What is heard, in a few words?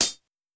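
closed hi-hat, Metallic Percussion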